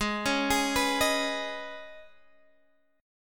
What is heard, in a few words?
C sharp 7th Suspended 2nd, G sharp